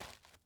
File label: dirt step